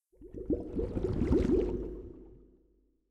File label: bubble column whirlpool ambient; Minecraft